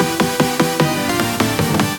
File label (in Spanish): perder vida videojuego es; western